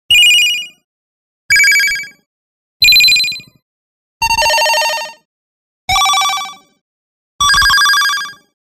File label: Bleeps Small Rapid Sm